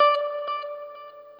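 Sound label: noodle short, guitar; Quantum Leap; 90's Sample CDs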